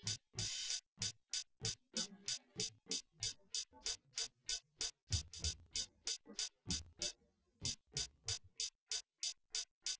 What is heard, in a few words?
wdrums inst sim; SASSEC07